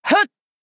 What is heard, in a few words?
jump